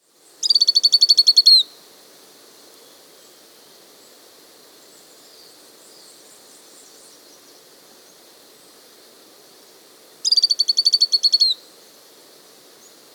Lemon-Browed Flycatcher (Conopias Cinchoneti), Andean Birds; S. America